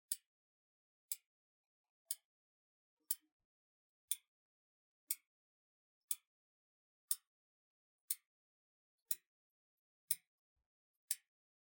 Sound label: Clock ticking Turning; ساعت; saat